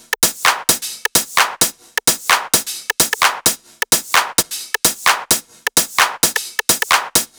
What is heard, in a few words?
130BPM Kit; Pressure 1 Kit